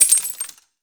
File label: water glass smash